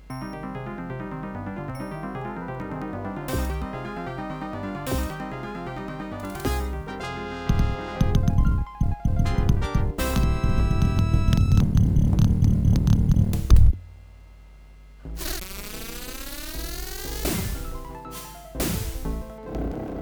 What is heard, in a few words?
Intro SB ES1868; ESS1868 AudioDrive; wc2